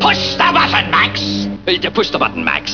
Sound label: BUTTON